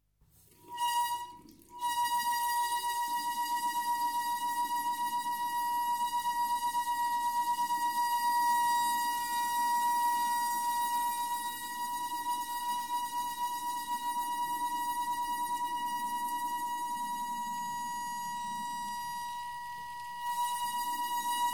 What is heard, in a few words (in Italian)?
ACQUA FISCHIO DEL RUBINETTO